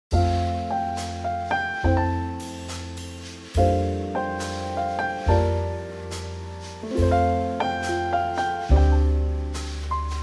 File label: F trio